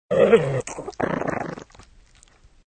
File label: Dog Bite; Bob the Robber 2